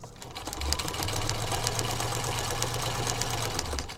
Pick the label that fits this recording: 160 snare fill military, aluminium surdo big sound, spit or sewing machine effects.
sewing machine effects